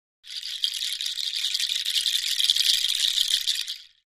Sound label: Shaker Bracelet Natu, percussion shaker